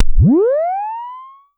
Pollard Syndrum

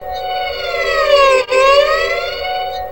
Violin; Sonic Foundry (Sony Creative Software); World Pop; Stringed Instruments

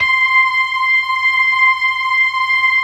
LO MAN V R, ORGAN LOWER MANUAL

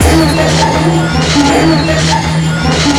FX, Futurist Drum & Bass

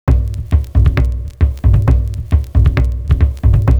300 HZ; Univers Sons

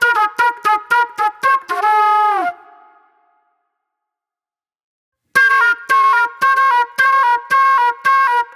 Raw Funk Flute

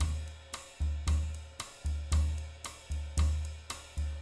114 CHA TOM, CHACHA, Living Drums; Sampleheads; Peter Erskine; JZ114 CHACHA

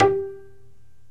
PITZ PIANO

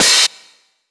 Hat, Hi Hats

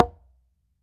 H CONGA SL, CONGA

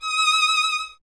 STR VLN, Vln Detache; Miroslav Vitous

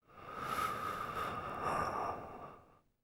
BRTH, BREATH FX, Jazz FX; Spectrasonics; Vocal Planet

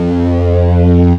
BAS, Eurotech; Sounds Good

